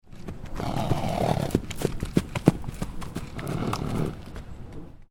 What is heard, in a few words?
Horse Trotting Up Agitated, Horse trotting up, breathing, agitated, excited, coming to a stop; Horses; Horse Sound Effects